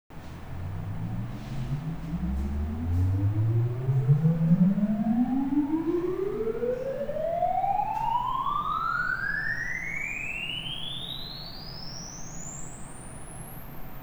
ESS

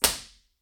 impulse response; Corso acustica

